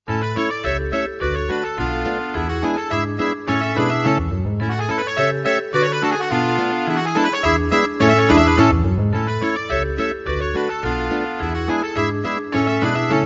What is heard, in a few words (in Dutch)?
Orkest